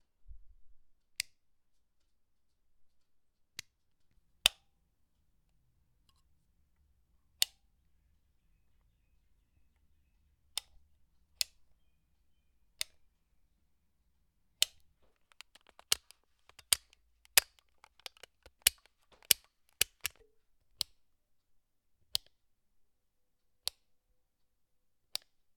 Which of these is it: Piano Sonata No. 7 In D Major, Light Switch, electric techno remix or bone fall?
Light Switch